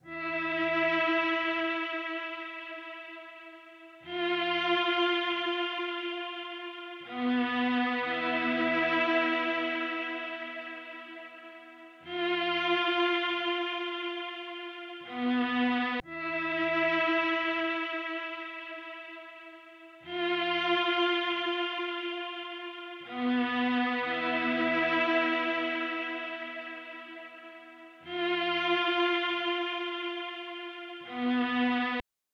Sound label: 120 BPM A Min String; Cymatics; Destiny Gold Expansion; Blood Ties